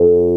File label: crowning, Synths; Notes